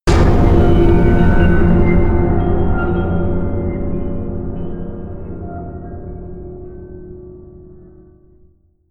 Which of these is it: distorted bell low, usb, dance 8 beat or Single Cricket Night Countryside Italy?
distorted bell low